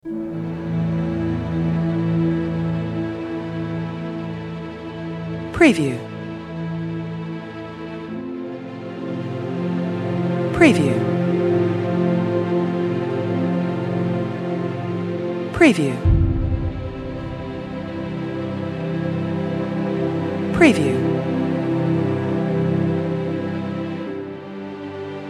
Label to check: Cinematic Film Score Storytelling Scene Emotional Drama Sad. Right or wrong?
Right